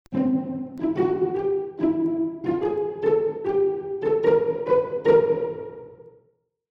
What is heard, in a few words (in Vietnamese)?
Nhac nen len lut vui nhon